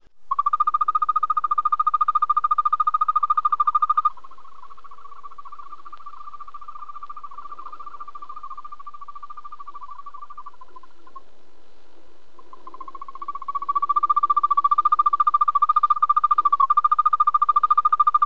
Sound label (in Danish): grønbroget tudse